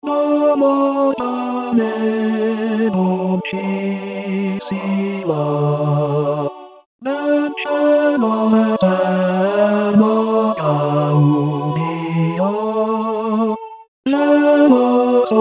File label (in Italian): tenori; d'amor pane dolcissimo